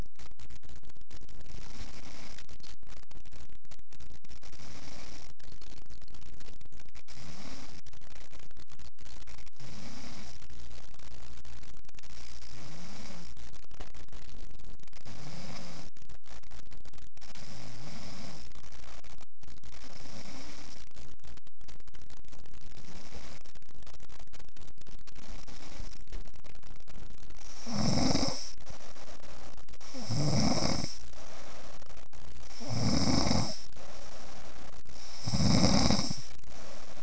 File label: snoring